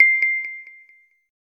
Countdown End

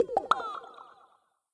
message notify

